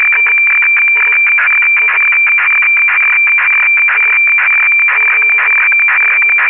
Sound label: hf fax